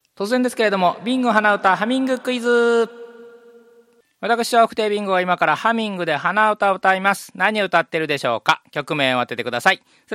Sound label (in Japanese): hanauta